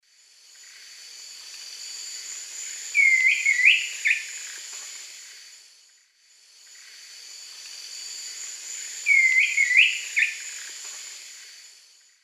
Chiroxiphia pareola, call; S. America; Eastern Lowlands

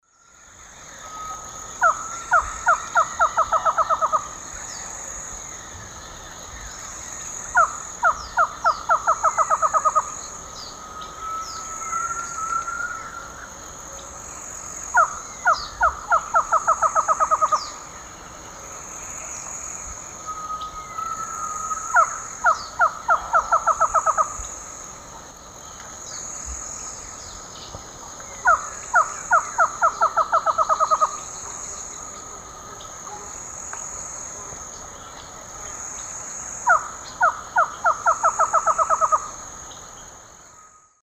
Thamnophilus cryptoleucus, song; S. America; Eastern Lowlands

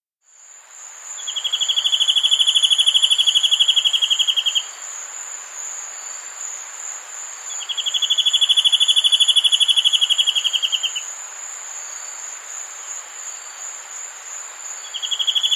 Spot-Winged Antbird; S. America

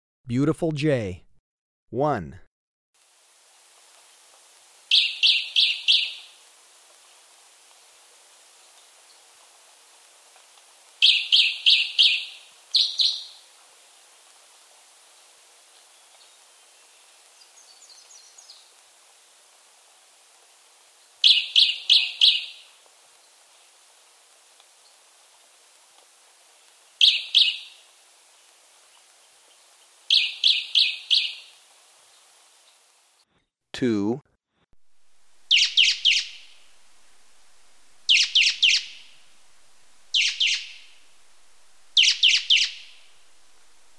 Beautiful Jay, Birds of Ecuador; S. America

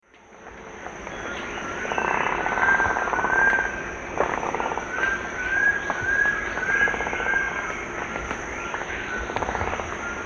Ramphastos vitellinus, bill claps; S. America; Eastern Lowlands

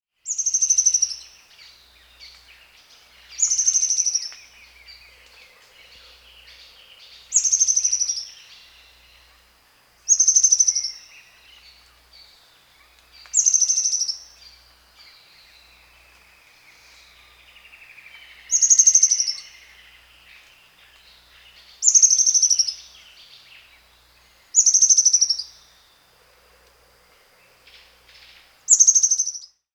Ornate Antwren (Myrmotherula ornata), Peru; S. America

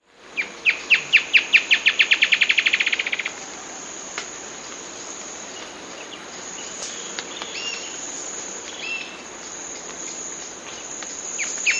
Spot-Tailed Antwren; S. America

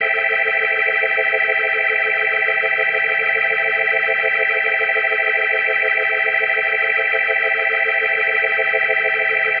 Organ A Min7 + 8va; Ambient Cinematic; Organ Licks

